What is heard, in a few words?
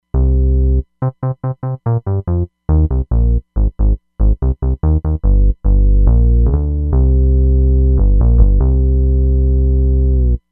Smooth Bass; Roland Fantom XR